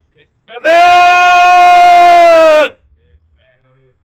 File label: a man shouting